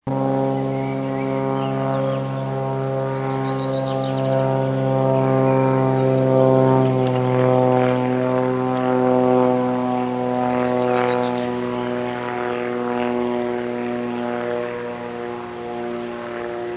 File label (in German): ueberflug